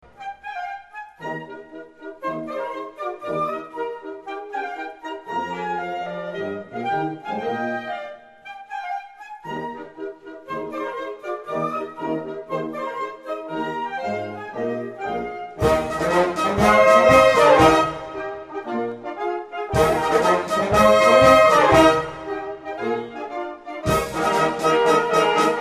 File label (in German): Marsch